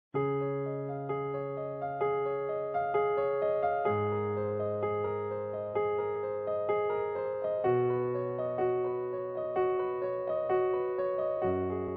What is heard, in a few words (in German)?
Playback C-Dur